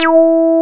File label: 101 E, SYN; INIS